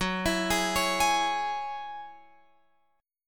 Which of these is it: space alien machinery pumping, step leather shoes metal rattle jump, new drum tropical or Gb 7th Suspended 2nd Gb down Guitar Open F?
Gb 7th Suspended 2nd Gb down Guitar Open F